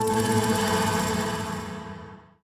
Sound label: Gun; the walking dead michonne 2 give no shelter